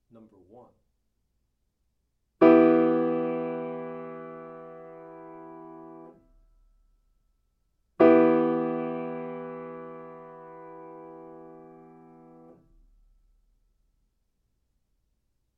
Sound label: chords; eartraining